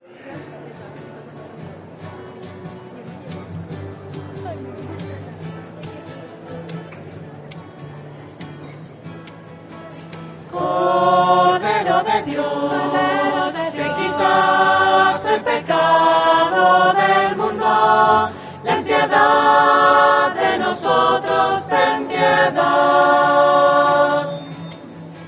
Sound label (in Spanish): voces; misas 2012